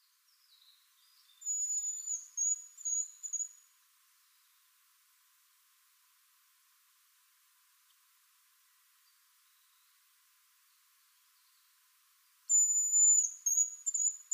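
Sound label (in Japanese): ezoraicho, hazel grouse; furano; koe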